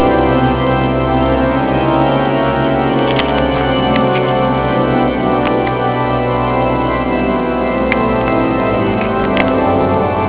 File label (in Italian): organo colonia